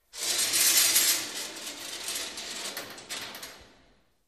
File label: Metal Gate Rolling